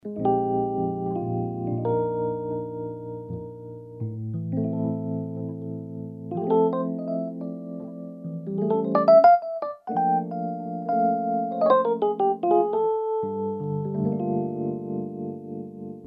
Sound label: Casio Privia PX-5S rhodes